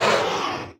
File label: Stun Dog